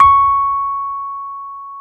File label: C#5, Electric Pianos; Wizoo